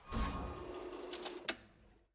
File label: sfx ui record finish click; caitlyn